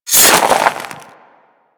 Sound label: Hammer Success Pull; Warframe; Grineer Hammer Boss; Foley